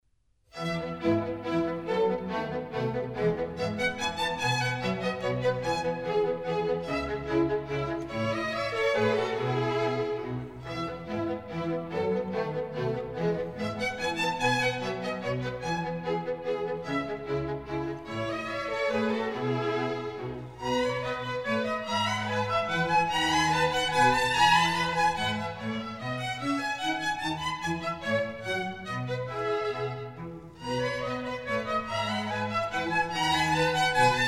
Minuets, E Major; Mozart; Dances and Marches

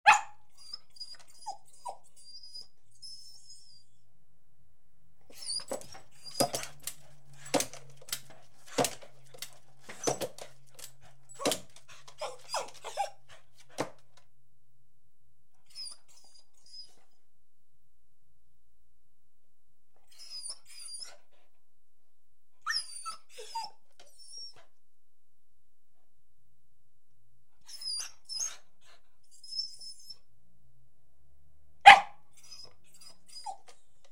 Beagle barking, whining, and jumping; Animals & Birds; The BBC Sound Effects Library

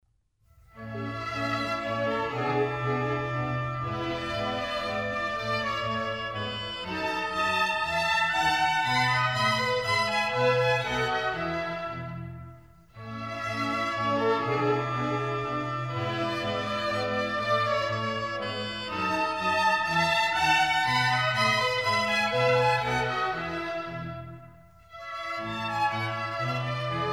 Minuets, A Major; Mozart; Dances and Marches

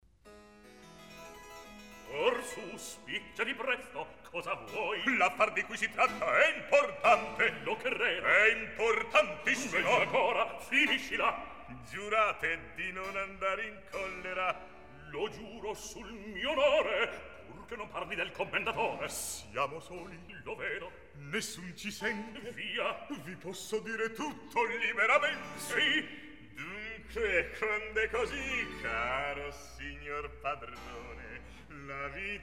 Recitativo; Orsu spicciati presto; Mozart, Wolfang Amadeus; Don Giovanni

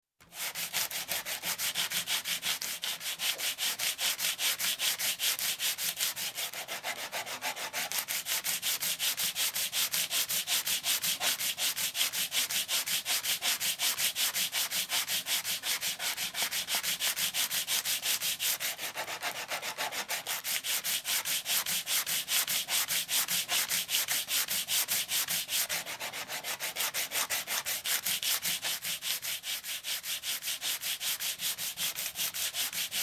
Sanding, Small Piece Of Wood; Construction; BBC Sound Effects Library

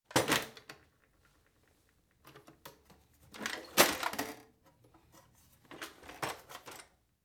Tool Box Opened; Construction; BBC Sound Effects Library

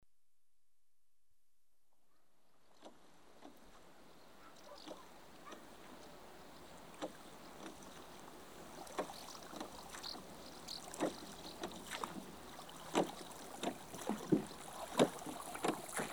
row past left to right, Ships & Boats; The BBC Sound Effects Library

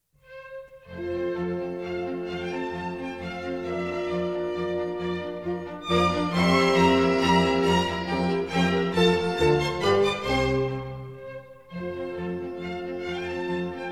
German Dances, F Major

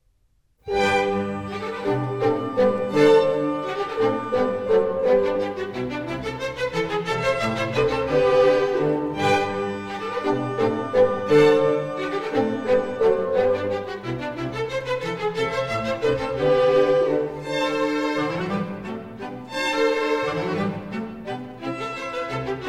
Minuets, G Major; Mozart; Dances and Marches

